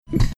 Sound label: making sound in background